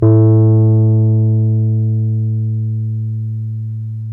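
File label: piano, Wurly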